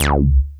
ARP ZAP BAS

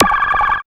SCI-FI; E-Lab; X Static Goldmine 1

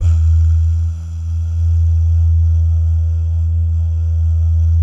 "bah", Vocal Jazz Fx

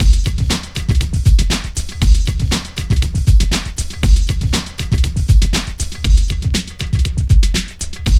PHAT LOOP; E-Lab; X Static Goldmine 2; akai

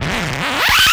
fly; E-Lab; X Static Goldmine 2; akai

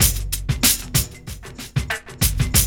LOOP; E-Lab; X Static Goldmine 2

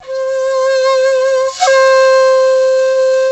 FLUTE

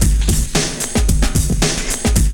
LOOP, DRUM; E-Lab; X Static Goldmine 2; akai

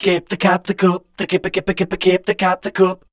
scat, voice

